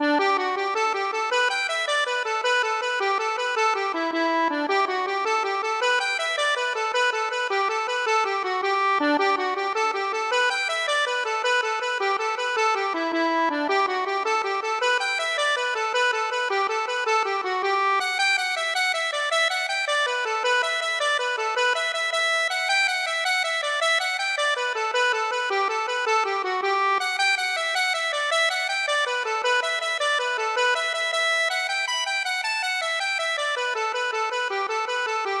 accordion

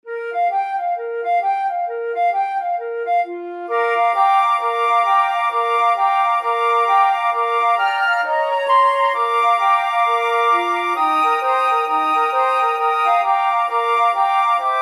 jingle bells boogies, flute quartet

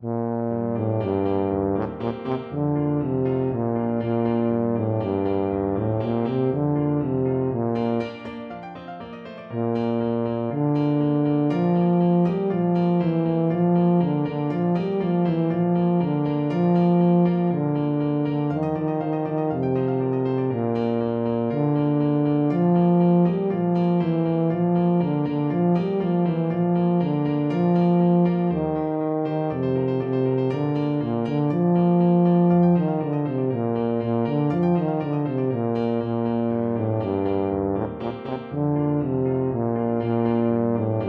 janitscharen, tuba

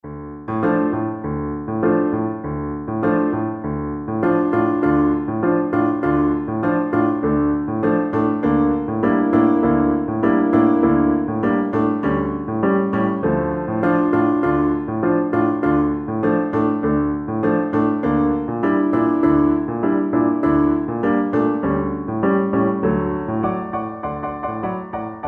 bizet habanera, cello

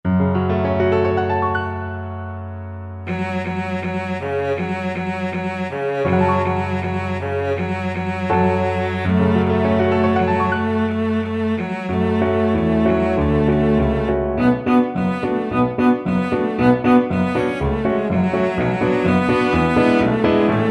siman tov, cello

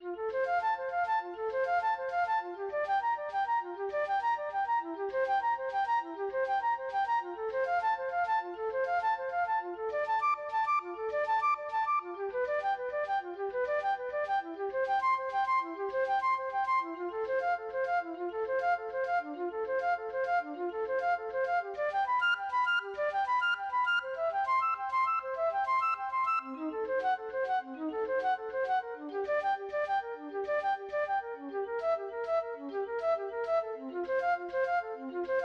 prelude, flute

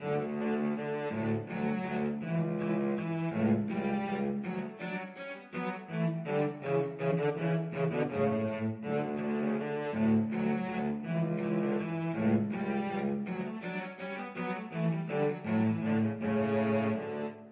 VLC, cello duet; mozart k487 no4

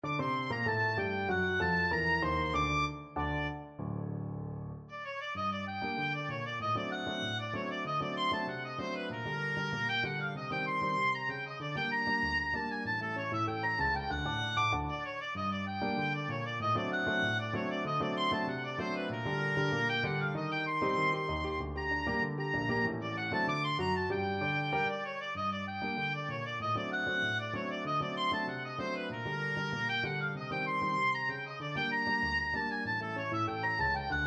oboe

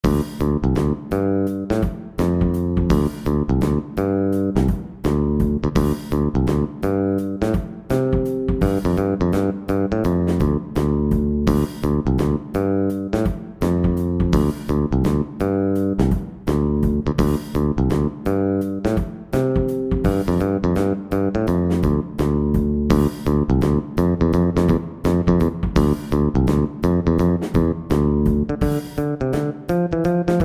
bass guitar

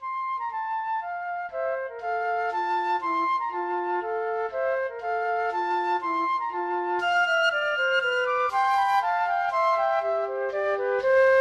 minuet, flute duet

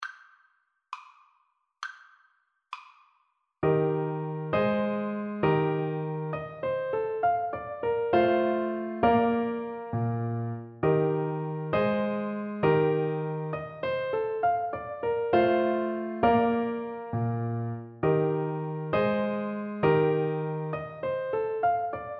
Gigue G Major trumpet

